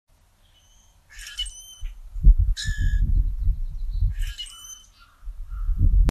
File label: common grackle